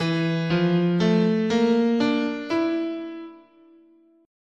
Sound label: E Iwato, scale